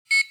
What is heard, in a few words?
BEP